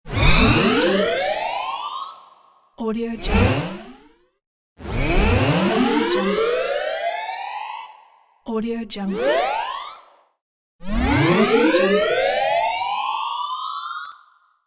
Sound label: charging up